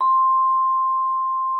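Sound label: vibraphone C4; Xsample VOL-4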